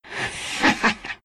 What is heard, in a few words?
laugh; vengefulspirit